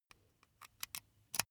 insert usb cable slow

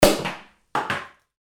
corking

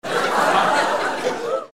laugh

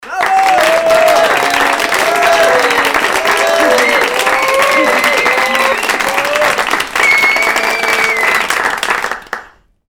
little group applause

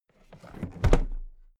toolbox put down heavy

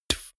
beatbox bass drum dull

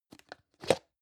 plastic can small lid open medium

